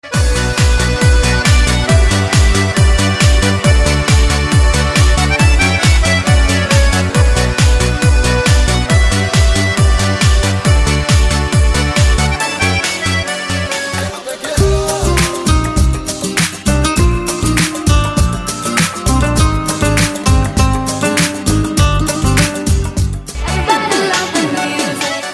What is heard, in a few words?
tango